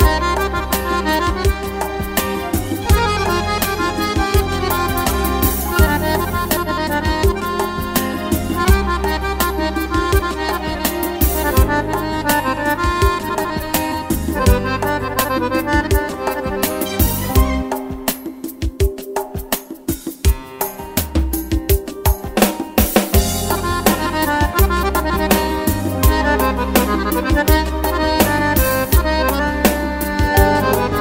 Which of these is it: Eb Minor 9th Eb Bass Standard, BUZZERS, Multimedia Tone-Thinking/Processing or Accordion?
Accordion